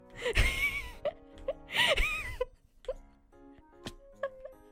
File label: laugh